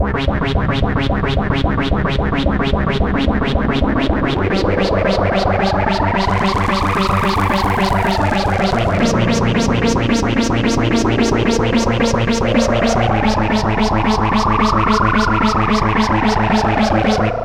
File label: Synth Arpeggio, Synths; Eclectic Electronica